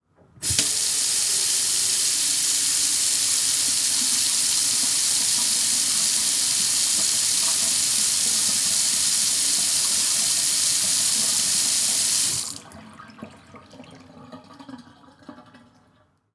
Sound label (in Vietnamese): Tiếng bồn rửa nhà bếp chảy nhanh